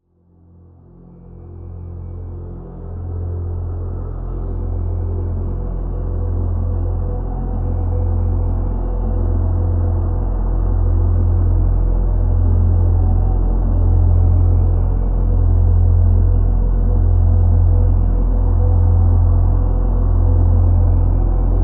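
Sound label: Drone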